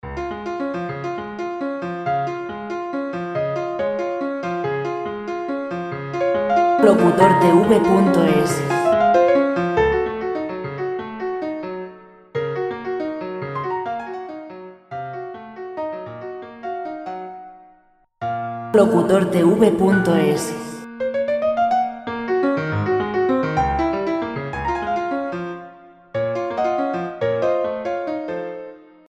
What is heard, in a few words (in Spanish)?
nocturno 70 clasica; Debussy